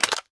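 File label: rifle magazine out; Weapons Guns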